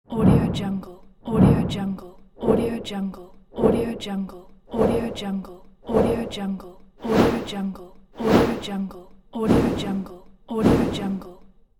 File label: whoosh